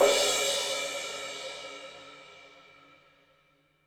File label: Crash Acoustic Chorus